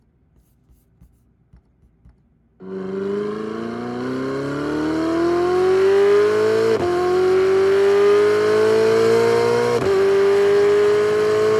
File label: Ferrari Amalfi engine rev